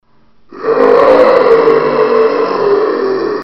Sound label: zombie; dod